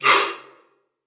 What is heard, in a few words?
exhale; percussive; REMIXABLE COLLECTIONS; Ze Franks Pain Pack; pain pack percussive and bips and clicks